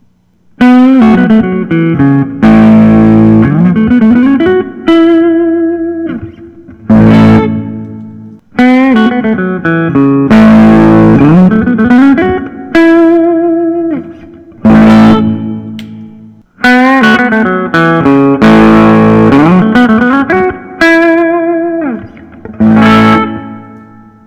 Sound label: collings i 35 lc deluxe; faded cherry